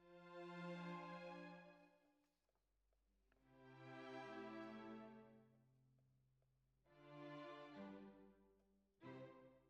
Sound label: Bassoon, Jazz for piano